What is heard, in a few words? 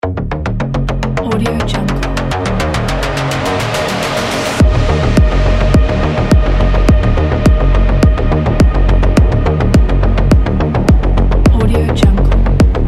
Dark Energetic Techno